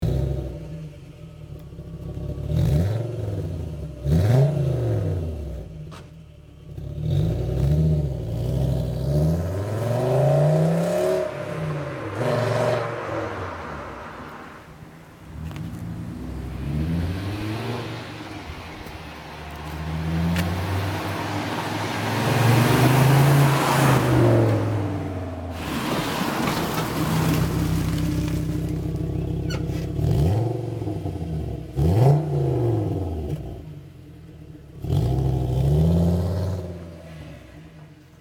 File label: Volkswagen Golf 4 GTi